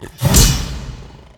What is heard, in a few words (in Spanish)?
sfx minotauro ataque fuerte